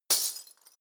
glass; comedy cartoon

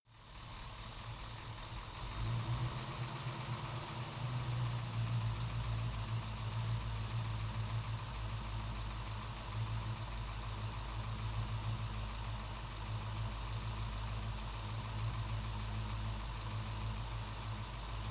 car camaro idle faster engine

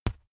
boxing gloves batter; sport freizeit; boxen

